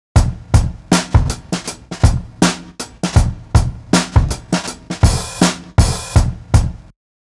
DJ Beat Juggling Pattern 1

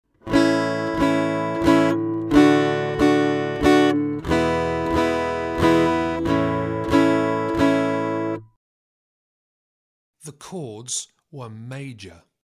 Aural Harmony Test, acoustic guitar; Grade 1

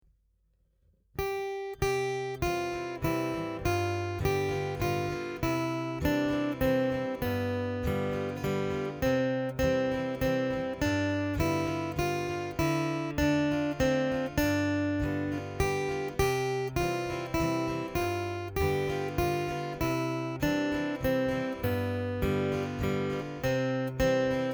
Streets of Laredo, acoustic guitar; Step 1

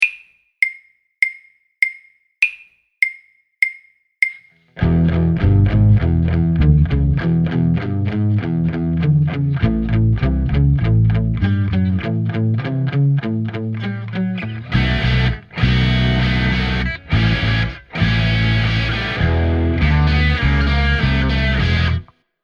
drum kit; Hold The Time; Performance Mix

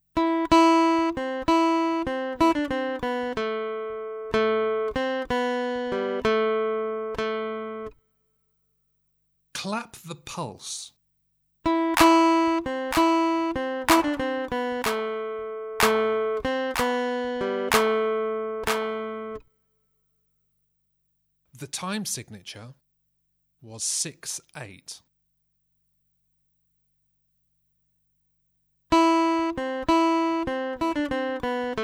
Aural Rhythm Test, acoustic guitar; Grade 4

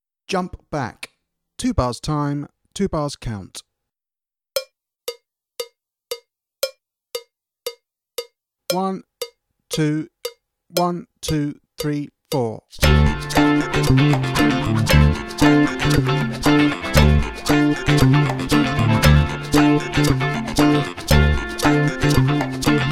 Backing; drum kit

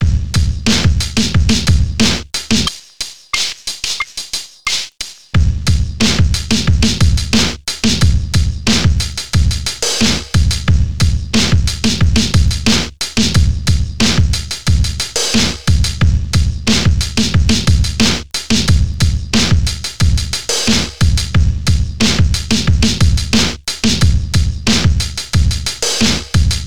DJ Breakthrough Scratch Beat

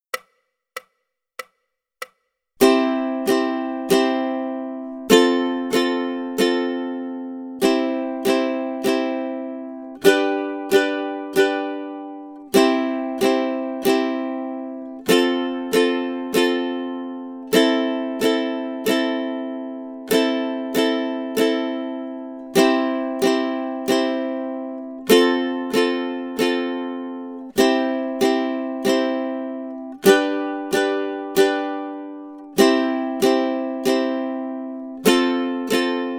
Rhythm Study, ukulele

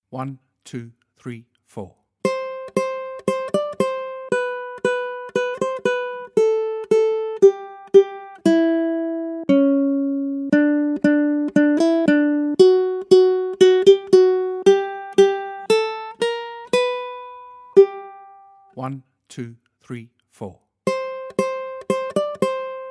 Accompaniment, ukulele; grade2

